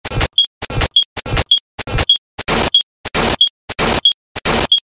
ringtone funny

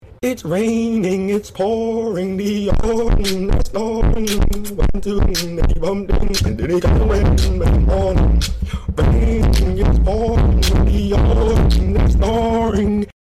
its raining its pouring

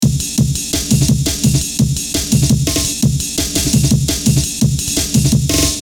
amen break drive

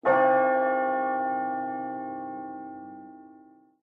church bell

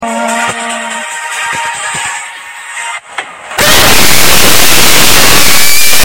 outro scream

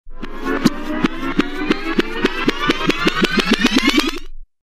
record rewind

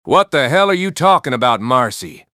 bell

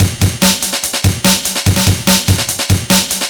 amen break

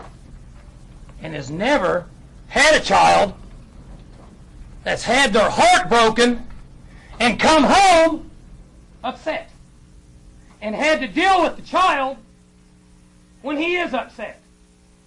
upset child

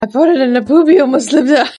farted

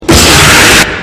springtrap jumpscare; ignited springtrap

